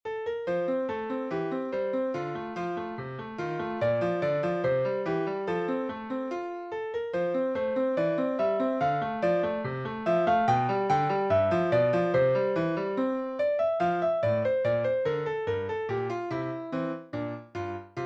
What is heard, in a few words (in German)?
Kleine Sonatine